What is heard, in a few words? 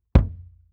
kick; dsp2008 sound library